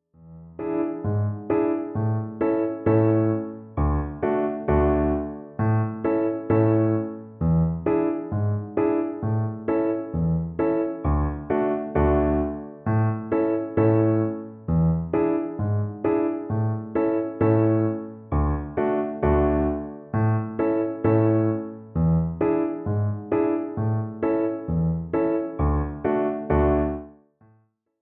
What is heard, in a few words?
polka